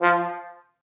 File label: Notes F Trombone; Scratch game maker software